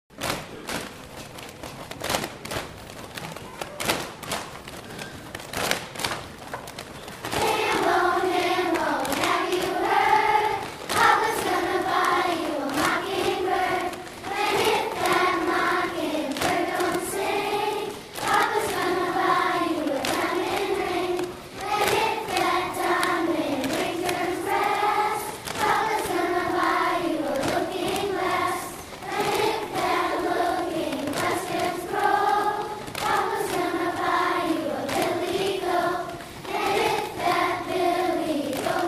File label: Hambone, Chorus, Concert Performances; Spring Concert 2010